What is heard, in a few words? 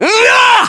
melee attack; ModernWarfare; localized english